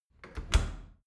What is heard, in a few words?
dry cleaner close soft